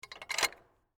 Gate Latch Coral, Fence; Gates (Sound Effects:Doors)

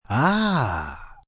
"Aah", Reaction, positive, male; Exclamation; Voice Box

